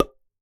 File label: Pop; Membrans; Pops Sound Pack 01